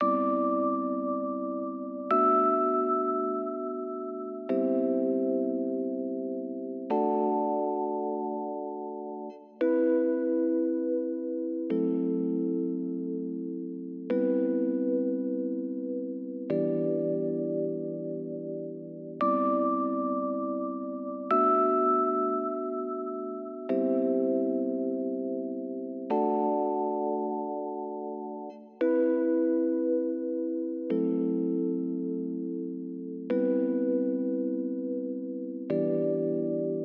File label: Downtempo Mellow Piano